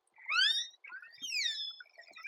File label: Chirp